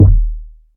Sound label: verby bass drum D# key, Royality free bass drum single hit; kick drum samples